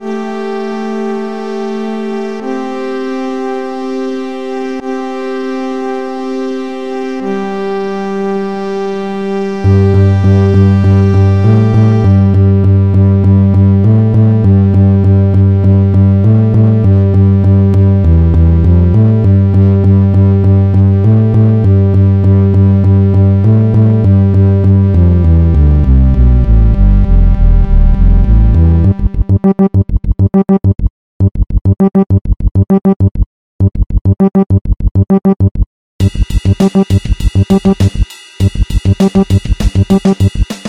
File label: Progressive Techno Piece